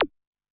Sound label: ET MooR H, Tom, Yamaha DTXpress-II